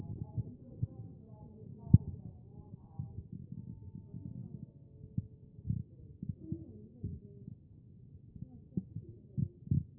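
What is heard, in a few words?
anterior chest, position 1; B MODE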